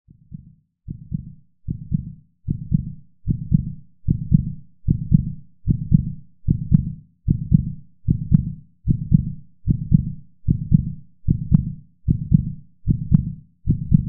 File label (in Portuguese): coracao fade